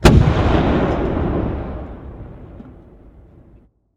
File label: explode